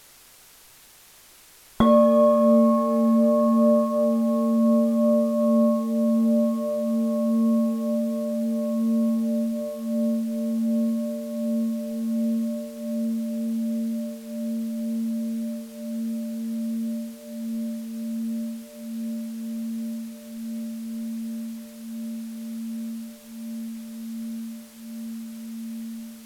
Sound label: spike island bell